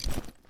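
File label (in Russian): одевание страховочного пояса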